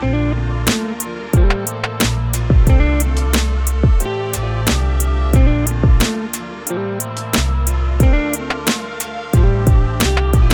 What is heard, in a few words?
Ressurection D minor 90 bpm